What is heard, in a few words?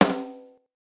snare drum delay; fett klingen lassen; Mixdown Tutorial: Snare Drum anfetten